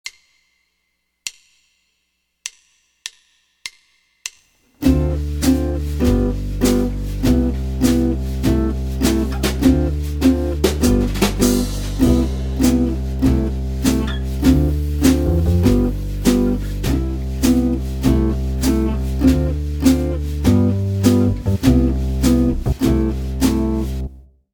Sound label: 2 5 1 C Major, jazz guitar